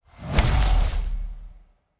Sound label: Orbital Hammer Death; TANK; 3Souls